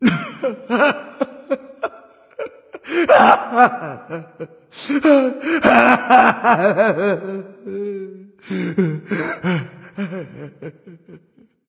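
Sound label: nonverbal boohoo; smite; voicepack; ullr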